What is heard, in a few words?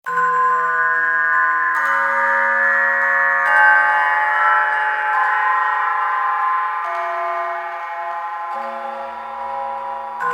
perverted lullaby; Among the Sleep